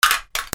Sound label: WEAPONS